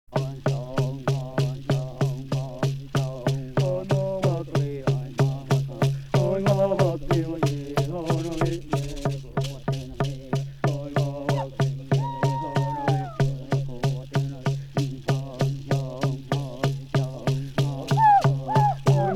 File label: indian dance